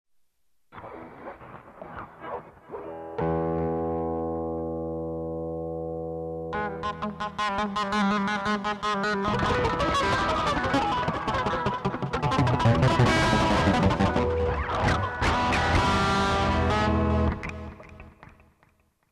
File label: Quick Hit, guitar